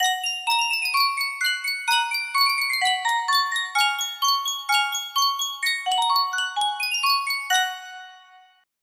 sankyo miniature music box

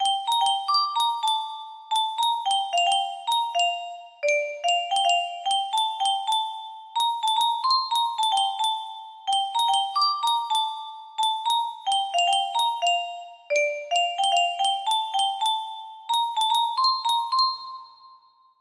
music box, music box melody

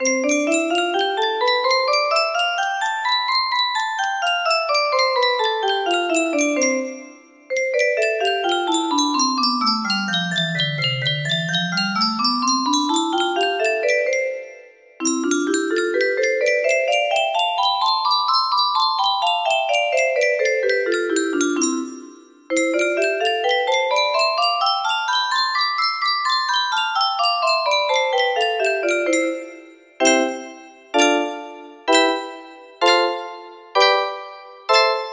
C major, melody

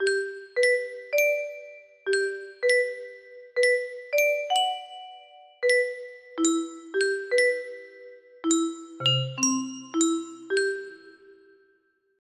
music box, music box melody

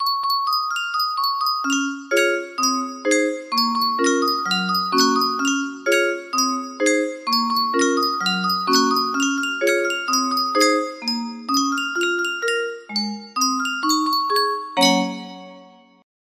music box melody

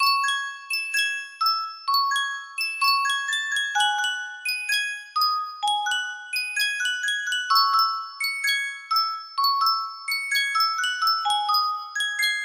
jokiwa music box, melody

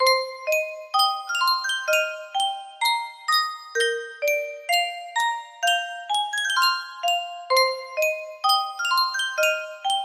Yunsheng music box

